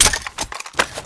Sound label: mag out, weapons